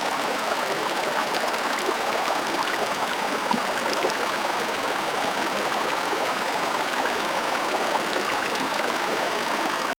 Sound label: calm and clear water flow, river